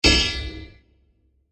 Bursting Metal Pole; Bursts (Sound Effects:Impacts)

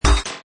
Hi Tech Panel Servo Button

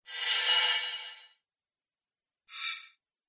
Magic Spells; Magic Sound Effects

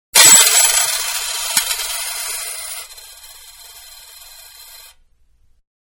Several Toss Onto Glass, Coin; Money (Sound Effects:Objects); Manhattan Production Music

